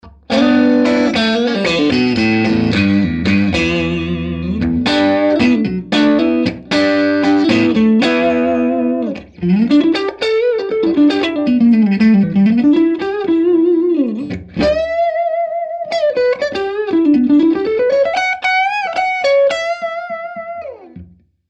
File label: overwound crunch neck middle; schwarzbach